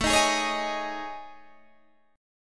A Major 7th Flat 5th, down Guitar Open D

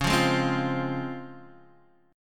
Db Major 7th, chord; strummed; JGuitar

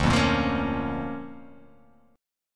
D Minor Major 7th Sharp 5th, D

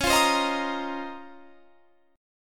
C sharp Major 13th, chord; C#M13; strummed

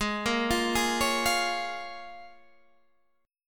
A sharp Minor 7th, G sharp

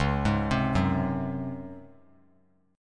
Db Major 7th Suspended 4th Db down; arpeggiated; JGuitar

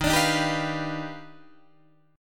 Eb Augmented Major 9th Eb down Guitar Open D

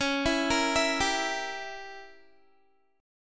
Db Diminished 7th Db down Guitar; arpeggiated

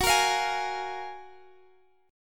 F sharp Major 9th F sharp, Guitar Half Step Down

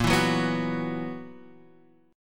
B Major 7th Suspended 4th Bb

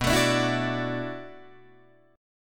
B Minor 11th B down Guitar DADGAD; strummed

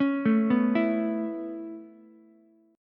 C sharp Minor 7th Sharp 5th C sharp Ukulele; C#m7#5; arpeggiated; JGuitar

